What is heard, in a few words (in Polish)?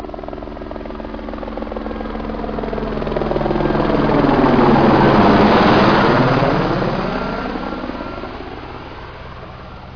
helikopter